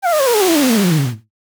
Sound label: rewind tape short